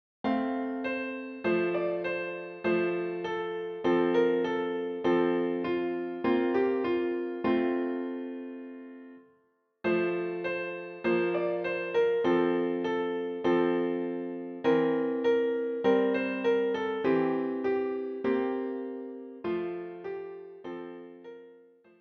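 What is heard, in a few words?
piano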